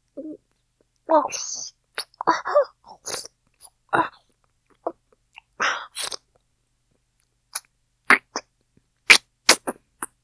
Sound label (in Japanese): 精液咀嚼; ジト目で爆乳JKうさぎっこ 首輪ちゃんのわけありや 初接客; フェラ増しスパイス！